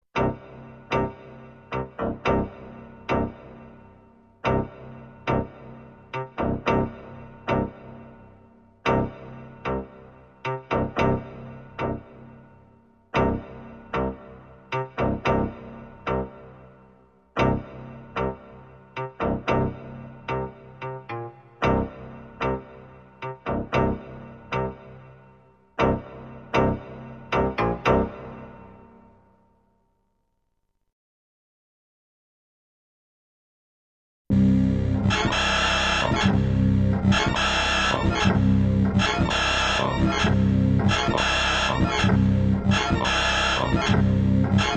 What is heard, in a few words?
Rhythm